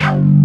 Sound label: CUTTER X BASS; Pro-Rec - Synthsonic; BASSES